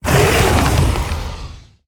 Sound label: Infernal Play